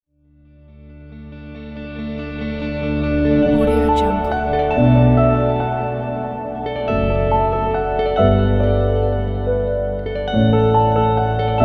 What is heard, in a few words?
elegance